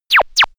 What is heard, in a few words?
baby laser; soundpool